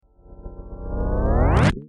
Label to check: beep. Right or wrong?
Right